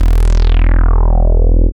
BASS, Tekno Industrial; East West

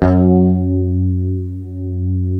G 2 HYPED STRAT, Guitars; Spectrasonics; Hans Zimmer Guitars VOL-1

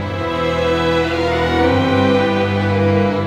Cinematic Strings, Orchestral; Sonic Foundry (Sony Creative Software); Orchestral Series VOL-3; Cinematic 17

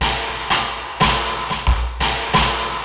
Soft Ronnie, Drum Loops, Distorted; Zero-G Smoke

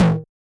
Yamaha DJX 2B, Hard Tom

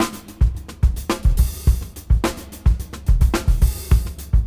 Junky Funk 107 BPM; AMG - Keith LeBlanc Phat Butt Jams

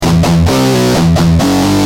G Jam, Guitar; Industrial

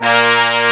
BRASS A2; AKAI S6000 CD-ROM - Ultimate

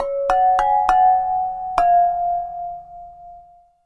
Flower Pot

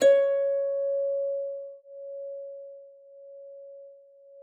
C5, HARP; Xsample VOL-8; HARP P+MF

